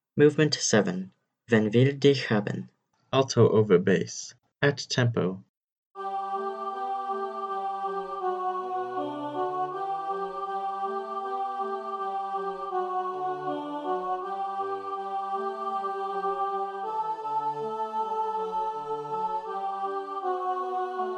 alto over bass at tempo, choir

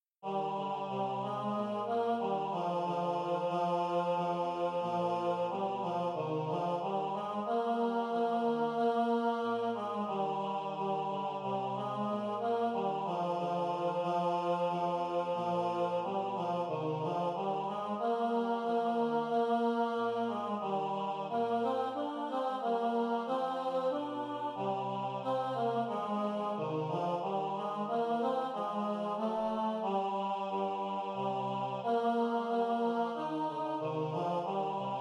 tenor over bass, choir; hymnal 1982; 91 break forth o beauteous heavenly light

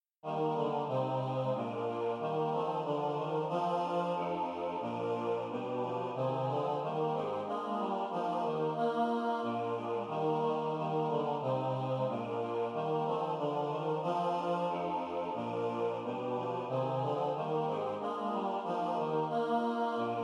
bass emphasized, choir; hymnal 1982; 91 break forth o beauteous heavenly light

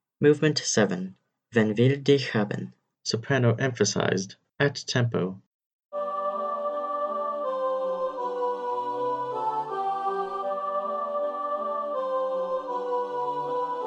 soprano emphasized at tempo, choir

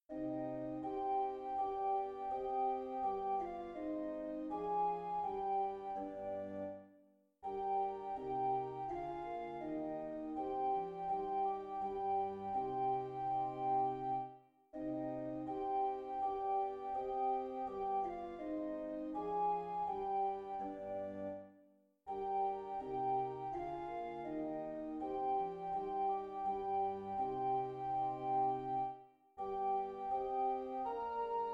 alto emphasized, choir; bach 93 sing bet und geh; sing bet und geh auf gottes wegen bwv 93

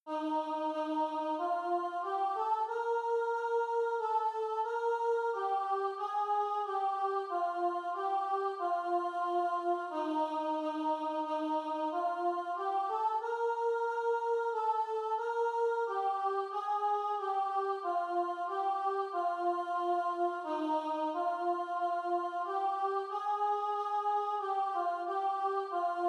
soprano alone, choir; hymnal 1982; 91 break forth o beauteous heavenly light

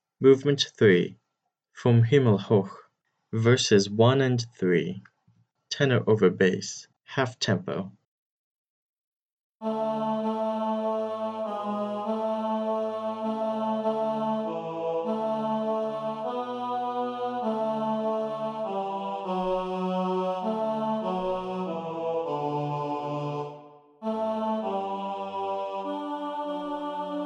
tenor over bass half tempo, choir

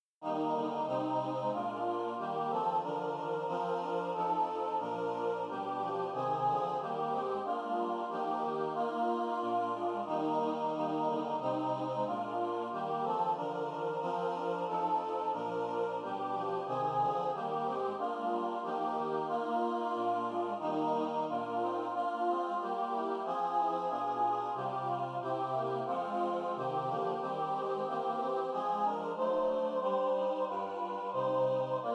soprano emphasized; hymnal 1982; 91 break forth o beauteous heavenly light

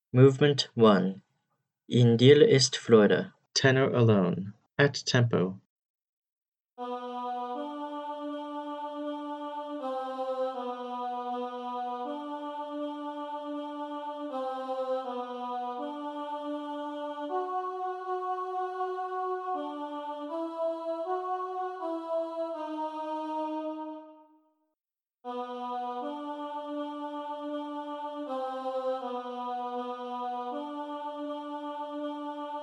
tenor alone at tempo, choir; edwards m a in dir ist freude movement 1 in dir ist freude

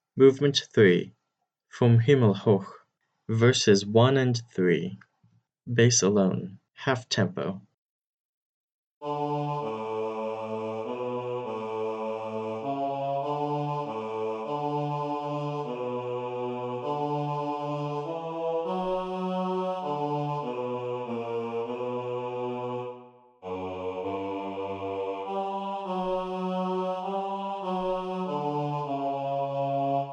bass alone half tempo, choir

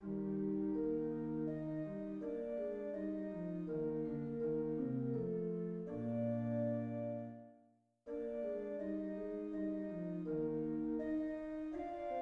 tenor add bass, choir; bach 140 wachet auf; bwv 140

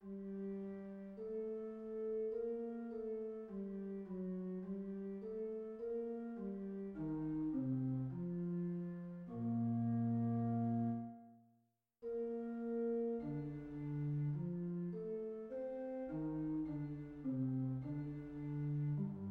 bass alone; bach 10 lob und preis; lob und preis sei gott dem vater und dem sohn bwv 10

